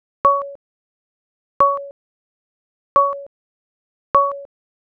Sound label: drip